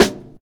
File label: dark sounding snare drum A key